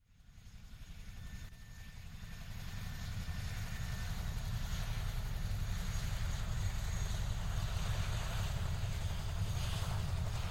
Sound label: Aircraft, Chinook hover and pass, 3D binaural; ASMR